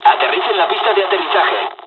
hydroplane land; War Thunder

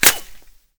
impact foliage; Modern Warfare

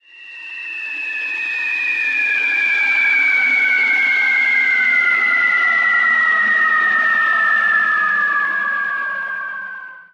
bomb whistle

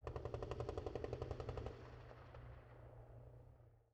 ambient shot mono

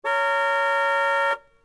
Horn; Civ4 warlords

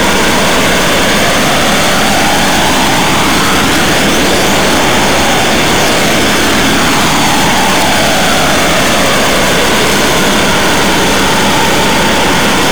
Aircrafts L-39 AI25 Around; DCS

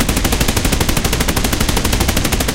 loop hispano suiza 30mm

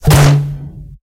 gui bns fail, tanks

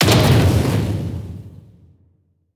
damage hits explosions, Warships

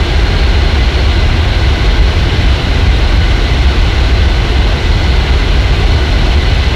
dive; War Thunder

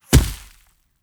generic bullet impact; Rust

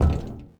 glass walk

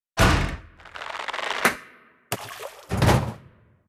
ambient port; World Of Warships; port3d

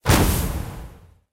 streak mission complete; War Thunder

